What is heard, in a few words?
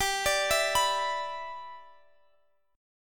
G 6th; G6 arpeggiated; x,22,24,21,24,x